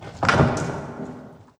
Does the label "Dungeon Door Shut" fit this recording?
Yes